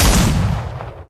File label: hical Shot, weapon